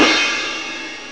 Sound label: hhat open; hardd; Amiga Soundtracker Sample Packs